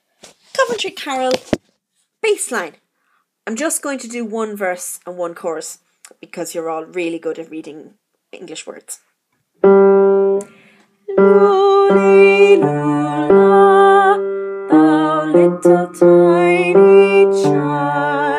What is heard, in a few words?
Coventry Carol Bass